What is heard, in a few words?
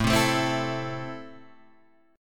A Minor, Guitar, Open G